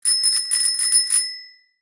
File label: ding